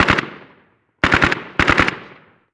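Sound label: gun ak47 distant; ModernWarfare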